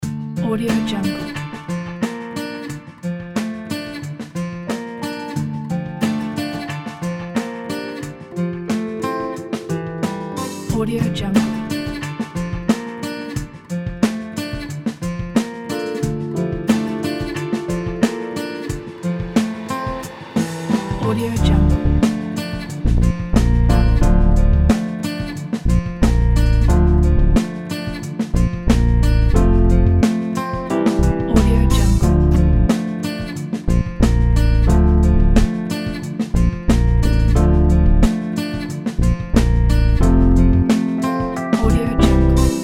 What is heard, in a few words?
acoustic dreams